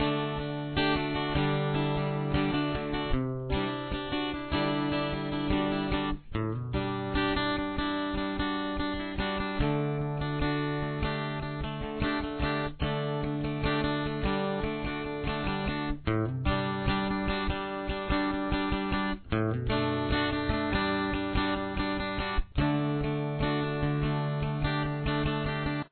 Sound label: intro rhythm